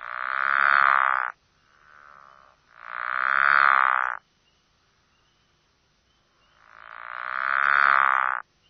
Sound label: Pickerel Frog